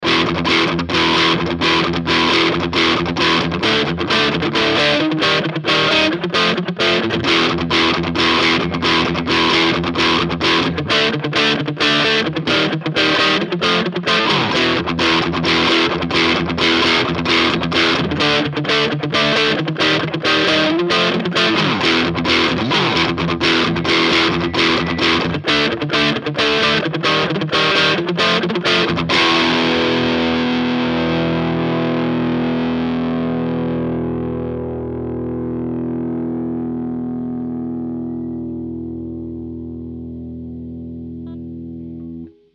Ibanez MH 400 Mid Zerre

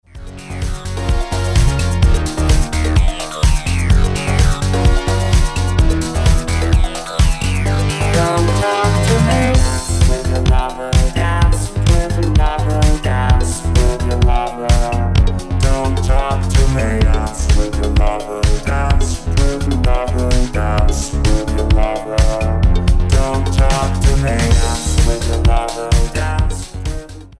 Tonhöhe

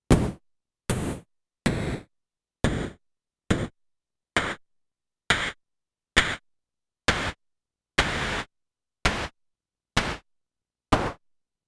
Soma Pulsar-23 Einzelsounds SD

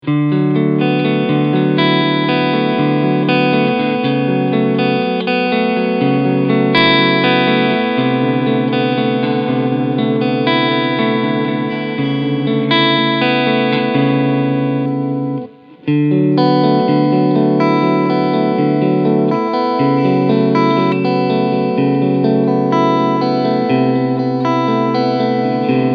Cleansound alle Pickups; Ibanez SA360NQM SPB

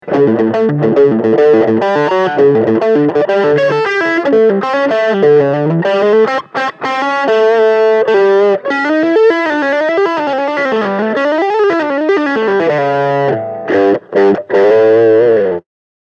High Gain Modus

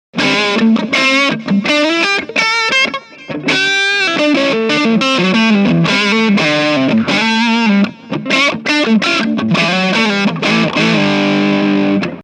hals zerre

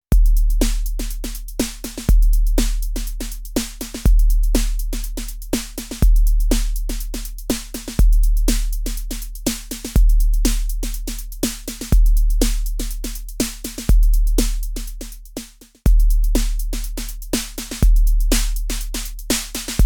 7X7 Vergleich alte neue 909 Snare

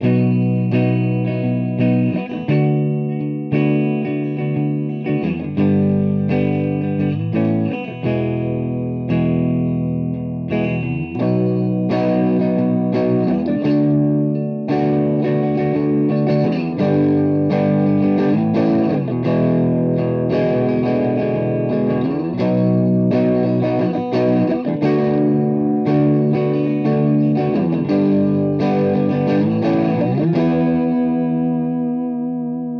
Verzerrer Pedale Neutral; Soul Food

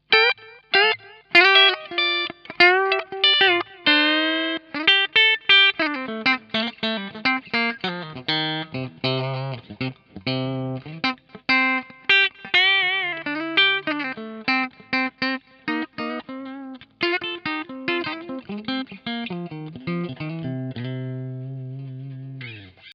Harley Benton TE 70 Steg clean

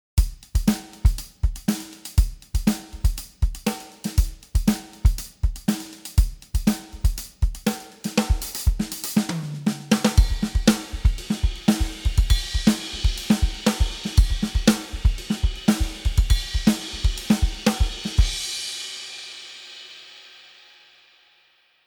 Drums mit MC

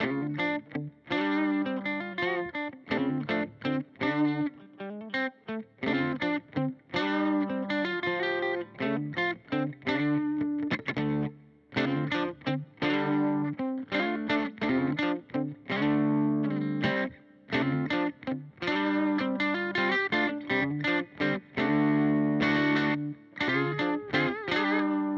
Boss GT-100 Core Fender Bassman Kompressor; Vergleichstest

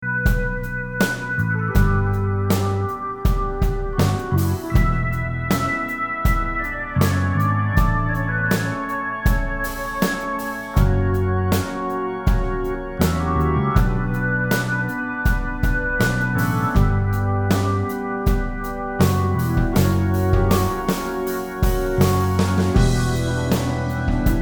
Drums Bass und Orgel

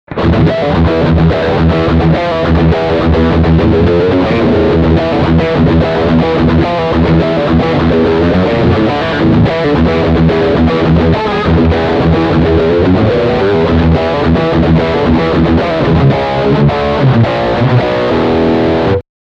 Riff Bridgehumbucker

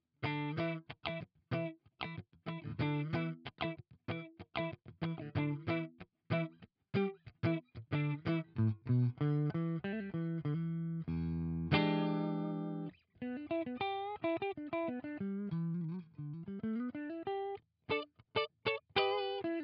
Harley Benton CST 24HB clean beide

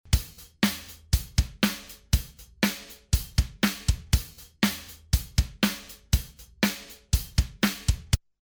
Reason 10 Drumloop trocken

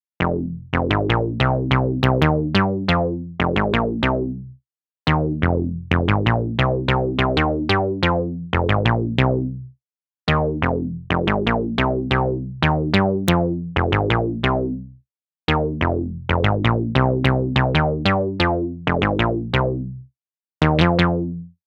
Resonanz Bass A

